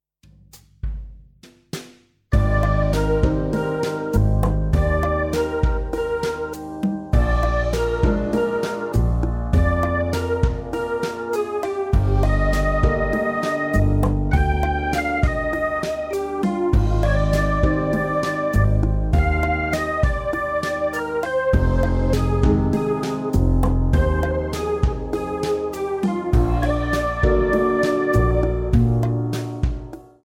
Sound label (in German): Fahrstuhlmusik